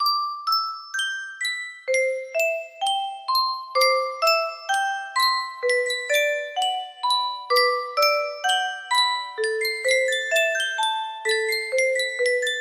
Yunsheng music box

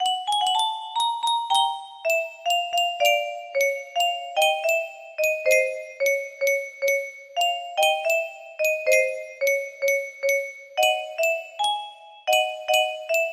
tuning, music box melody

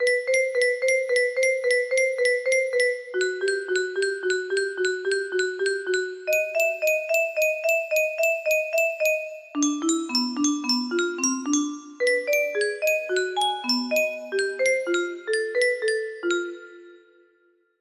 series theme music box; hiperkin

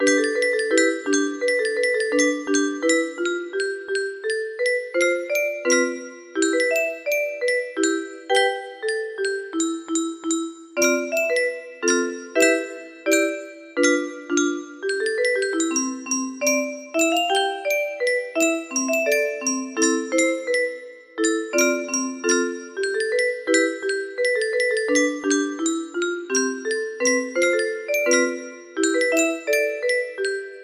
music box melody; spy x family

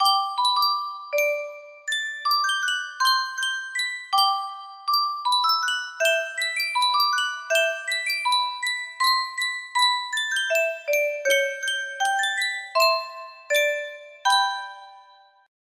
Sankyo Music Box, music box melody

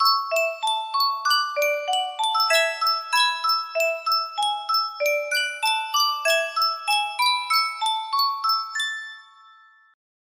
Sankyo Miniature Music Box, music box melody

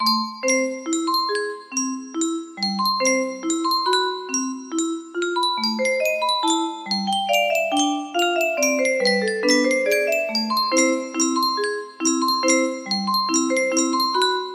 melody

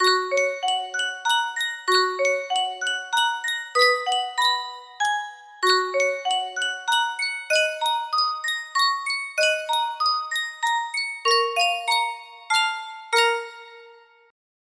sankyo music box, waltz

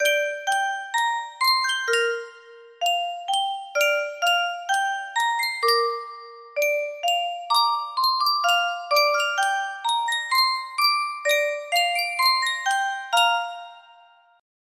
High Pitched, music box melody

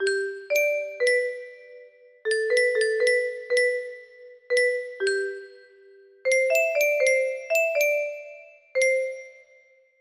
music box melody

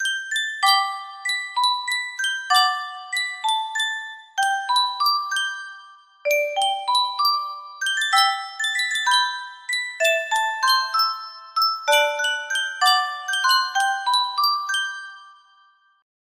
yunsheng custom tune music box

